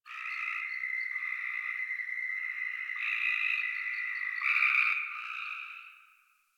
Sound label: Tree Critters Happy Calm; Ambience; Props; Warframe; Duviri